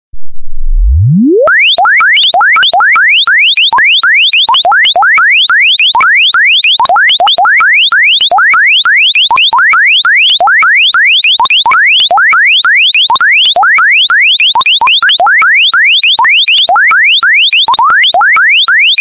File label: LOUD RING, ringtones